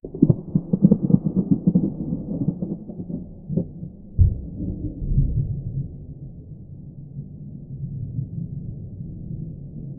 trueno retumbando a lo le

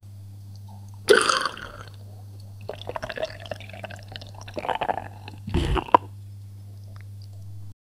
el sonido de una persona, drinking

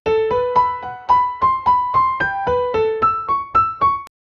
meloda con piano clasico, house music

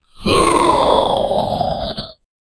goblin tomando dano grrrg

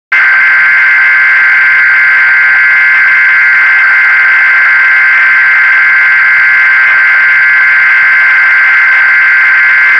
alarma de emergencia

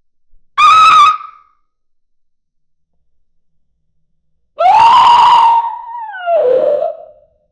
gritos cortos de una cria

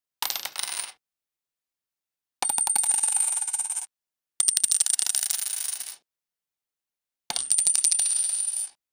efecto de sonido corto, collect

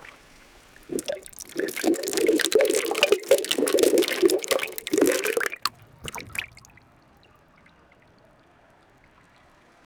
el agua de una pileta, pool

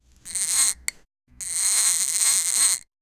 grito de susto de animatr; animatronic

